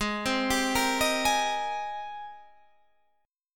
Ab Major 9th Ab, Guitar Drop D

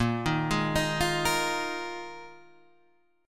Bb 7th Suspended 4th, Guitar Standard, chord; arpeggiated; JGuitar

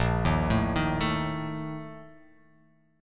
Bb Major 7th Suspended 4th, chord; arpeggiated; JGuitar

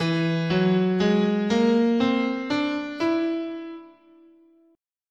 E Whole Tone, scale

